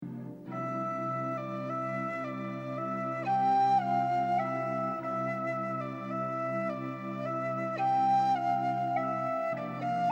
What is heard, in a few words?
low whistle